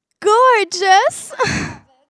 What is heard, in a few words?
Gorgeous Jingle